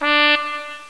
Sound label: Trumpet; Sound Effects for Multimedia - Volume 1; horn instruments